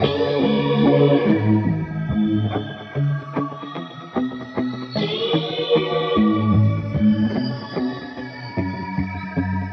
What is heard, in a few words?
To Blip, loops; pandorastoolbox